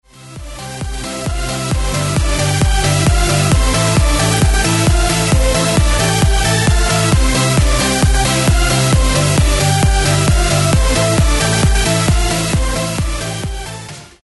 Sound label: funk rnb hits remixed; multitrax